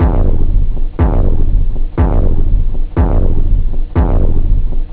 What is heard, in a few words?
bangbang, drums technobd